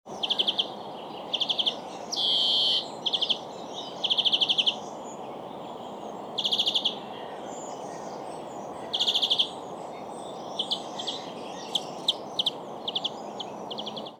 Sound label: Chloris chloris; SETE